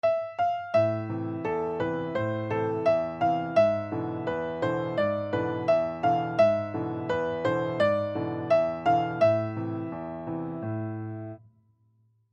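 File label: complete musical phrase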